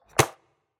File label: arrow impact medium; SoundsCrate